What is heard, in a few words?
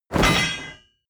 drop metal blade large